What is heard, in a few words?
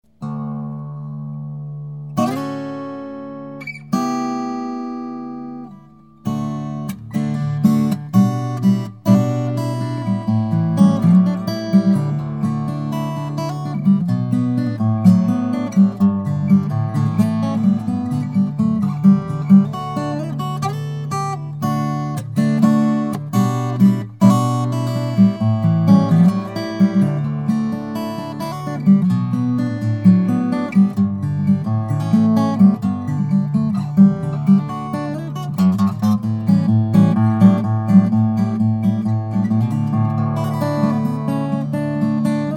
Guitar